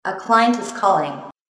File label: Melody, RingTune